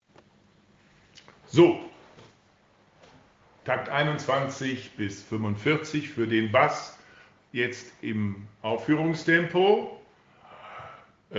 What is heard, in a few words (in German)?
BN Bass atempo